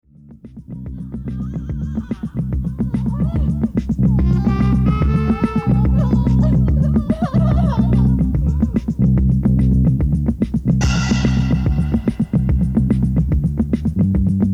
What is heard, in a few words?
Hot Air Jazz Lowcut